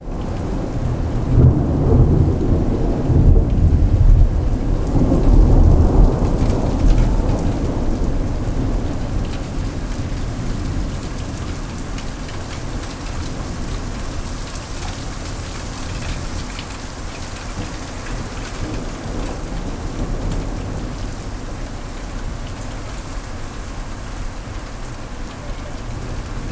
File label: Thunderstorm With Heavy Rain